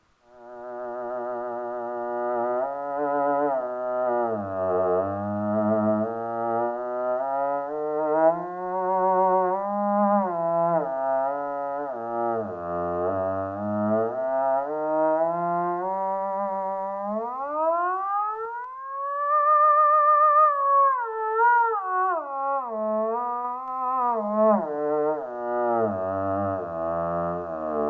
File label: ews resonator, theremin